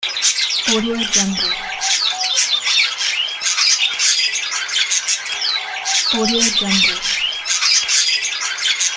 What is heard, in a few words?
birds chirping